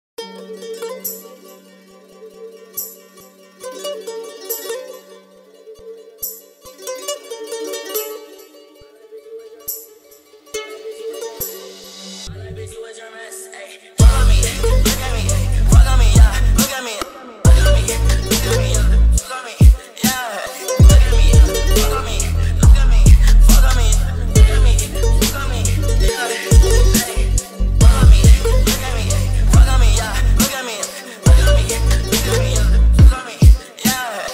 bass boosted

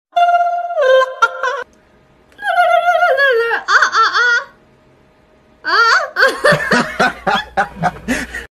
funny song

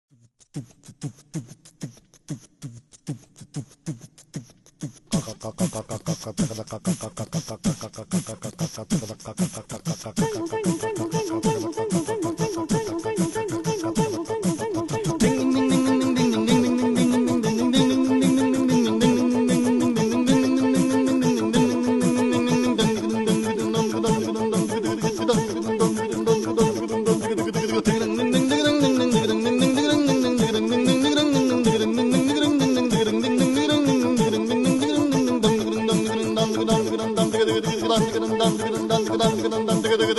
ethno folk ensemble

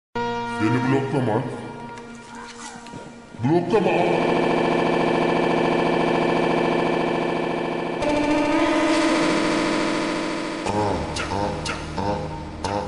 funk slowed reverb

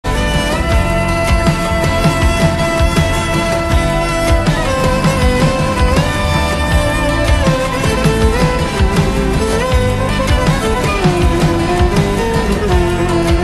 slowed reverb

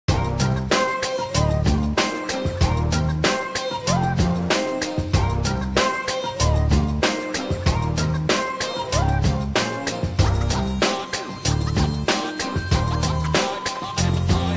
menu music; brawl stars ost

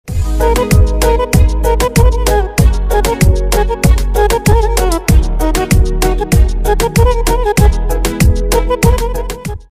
oriental reggaeton beat x balkan ringtone; Prod By Bujaa Beats

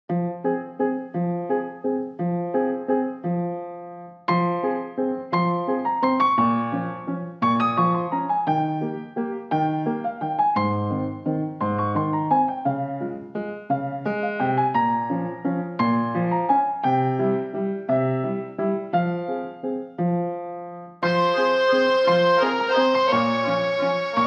piano

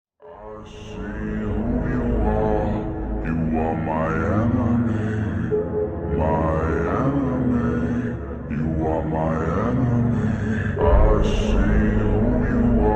slowed

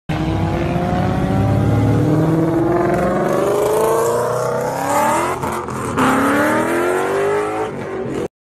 honda civic vtec; honda civic vtec2; warex sesi

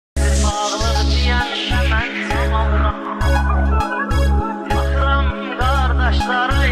azeri bass music remix bass mahni; xarici mahni

